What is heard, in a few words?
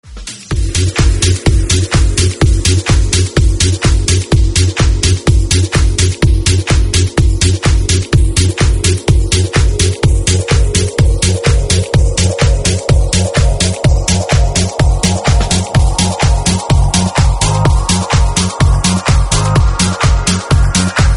Jackin House Instrumental